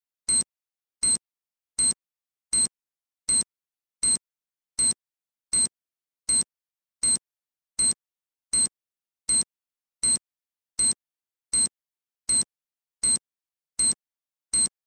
c4 beep

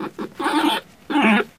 tushkano idle

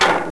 ladder, player

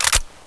oicw clip in, weapons

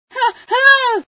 scream, female sci

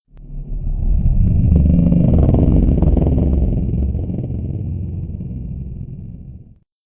breathe, cthulhu; sven; npcs

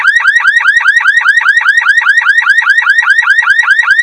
alarm

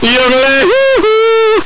yodel

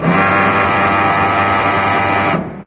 alarm, ambience

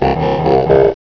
alert

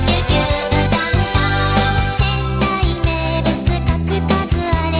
gtr

